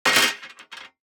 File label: DICE on Metal, Throw and Roll, Standard, 5 Five Dice; Samples; ArticulatedSounds; DICE Sfx Party (Extended)